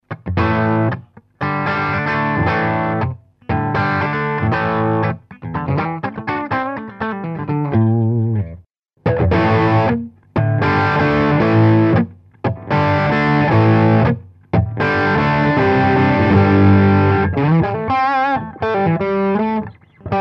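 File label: Spiritual OD Mixdown, guitar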